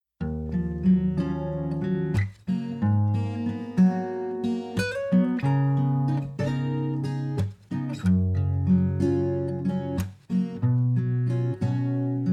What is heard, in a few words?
remix instrumental